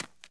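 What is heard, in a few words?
run light, dirt FOOT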